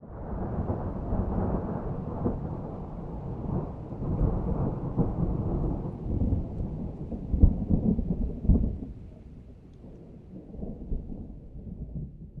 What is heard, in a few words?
medium, nature; Atmospherics